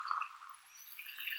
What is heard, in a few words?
Chirp